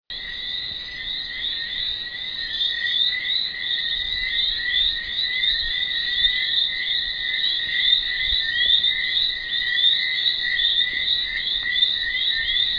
eleutherodactylus martinicensis eggleston dominica; Its call is a single, rising whistle; West Indian